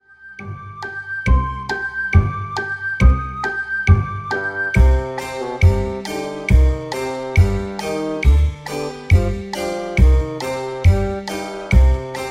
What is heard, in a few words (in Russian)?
nach skazki, muzyka dlia nachala skazki